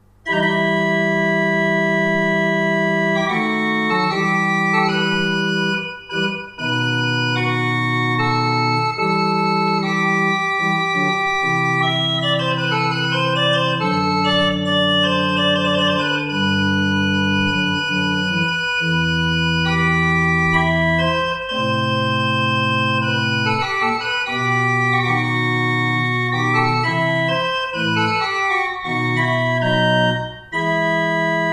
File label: pipe organ